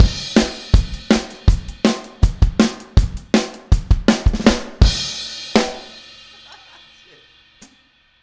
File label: Outro Full Mix, Drums 162 BPM; Drums from the Big Room The Mixes; Take Your Toms Off